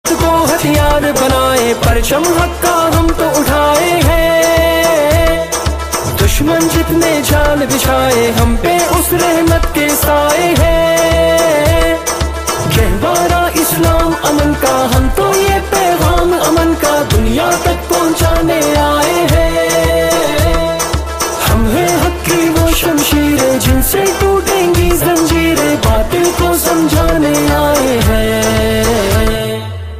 Urdu Pakistani Ringtone; Ertugrul

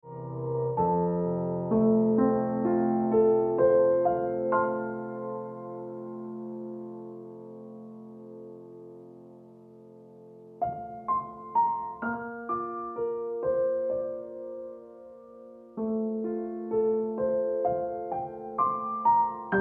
Piano Music

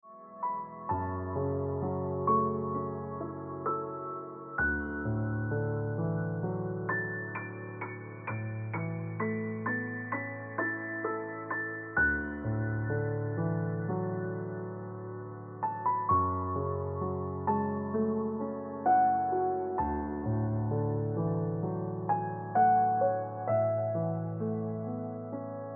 Ringtone Piano Music

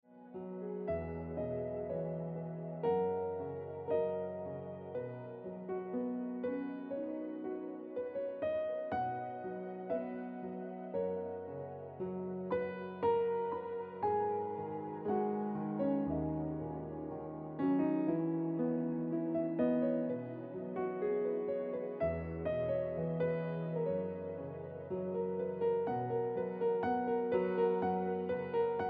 Piano Music

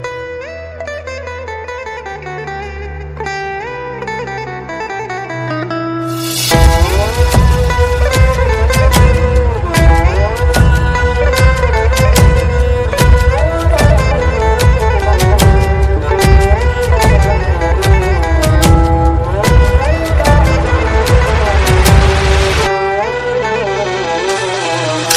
Marsi Turkish Turkey Ringtone

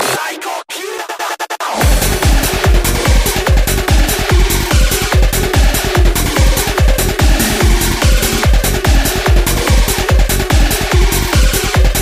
Mexican Ringtone

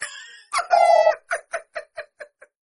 Laughing Ringtone

